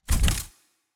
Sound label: Camera Shutter Click Design Double Trouble; 5.1 (LCRLsRsLf); Hollywood Cameras